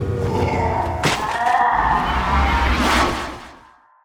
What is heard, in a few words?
templar knight death, monster